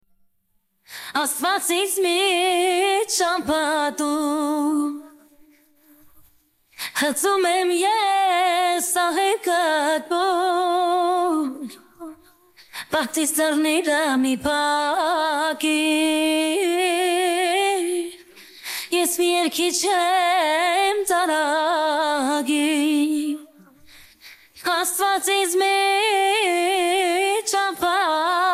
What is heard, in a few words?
vocals